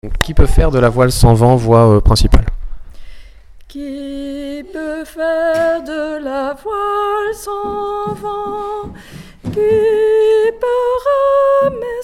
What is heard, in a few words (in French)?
voix principale; Qui peut faire de la voile sans vent